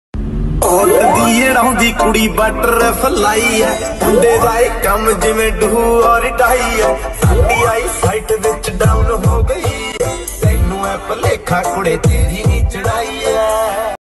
Punjabi Beats Ringtone

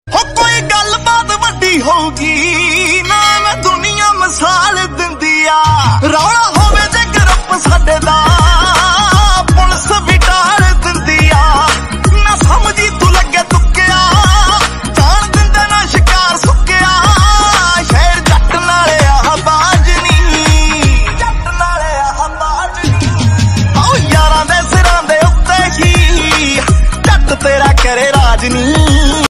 Punjabi Beats Ringtone

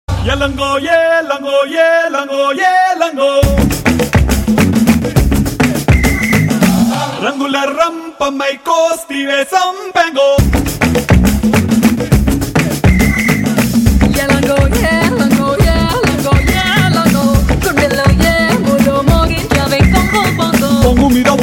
Telugu Ringtone; Ooseravalli

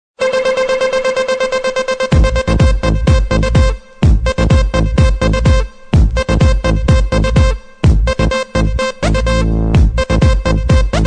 Bass Techno Ringtone

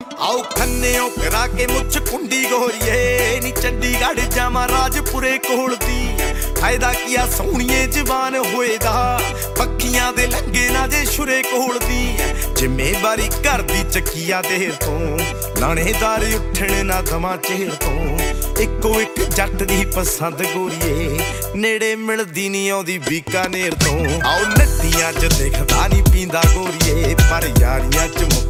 Punjabi Beats Ringtone

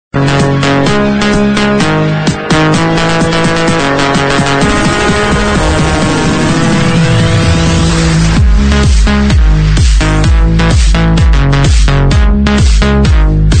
Trance Music Ringtone

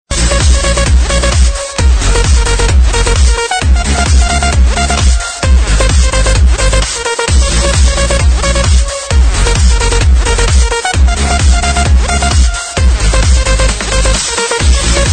Electro Remix Ringtone

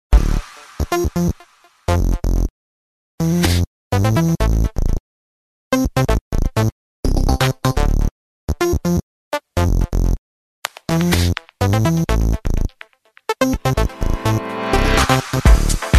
Digital Ringtone

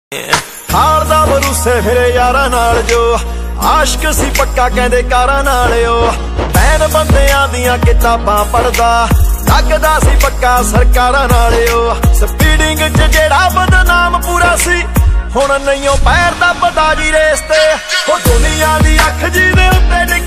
Desi Punjabi Ringtone

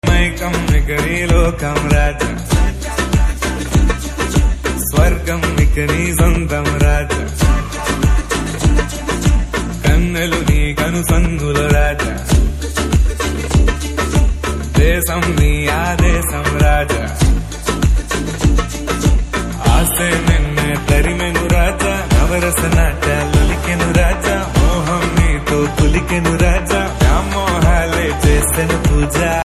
Theme Telugu Ringtone; Raja

